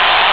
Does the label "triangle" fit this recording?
No